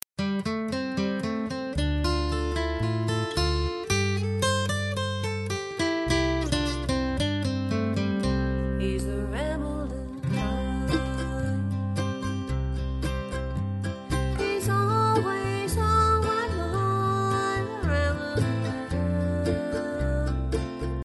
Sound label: ol banjo ramblin